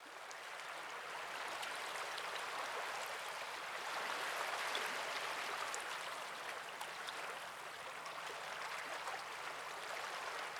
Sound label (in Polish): podklad morze; apocalipsis